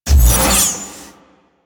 Sword Whoosh; Warframe; Cephalon Weave; Weave Boss

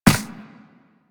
Run Ice, Footsteps; Warframe; Operator

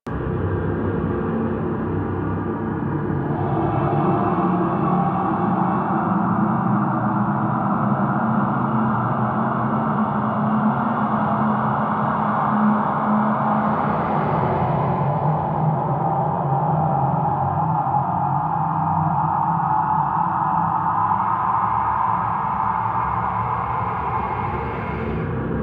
Forest Interior Cave Small Amb; Warframe; Ambience; Grineer Forest

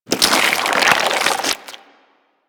Infested Devourer Retract; Warframe

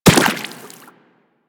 Run Water, Footsteps; Warframe; Teshin

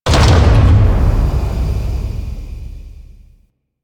Vaults Plataform Stop; Warframe; Entrati

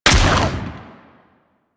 Vomit Gun Frenzied Shot, Weapons, Infested; Warframe

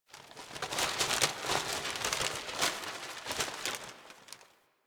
Paper Blowing G; Warframe; Ambience; Grineer; Carnival

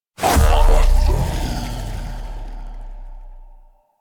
Disarm Cast; Warframe; Pacifist

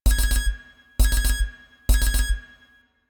Mech Stamina Low Loop; Nechro Mech; Vehicles

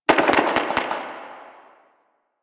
Distant Gunfire; Warframe; Ambience; Nineteen Ninety Nine

